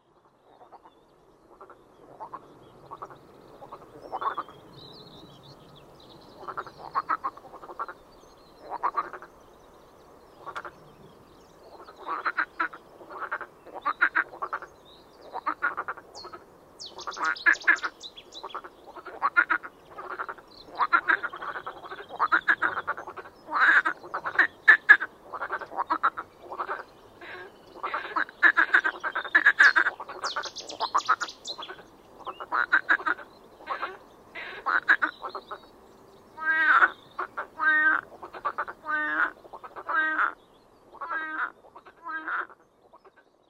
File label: marsh frog